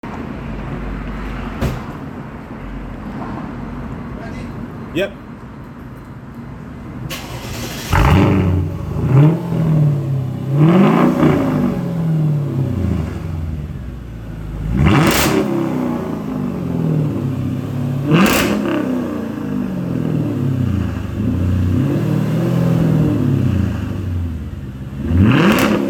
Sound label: secondary cats resonator muffler delete exhaust; w210 amg